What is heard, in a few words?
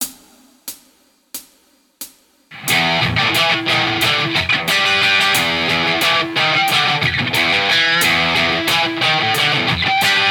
Lickbag, Guitar; Guitar Techniques 2019 Issue 290 January